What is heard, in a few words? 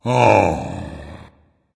sigh dragon; fafnir